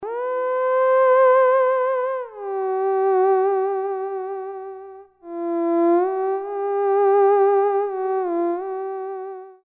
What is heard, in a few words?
theremin; BIG ETHERWAVE KIT